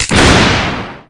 big pencil break; mamemomi

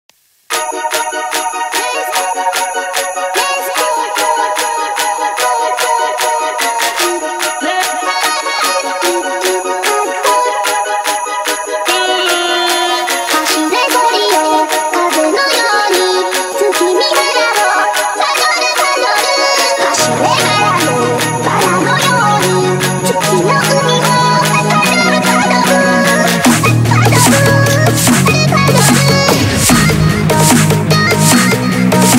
dubstep remix

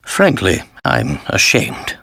ashamed; layton

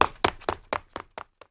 run; gina

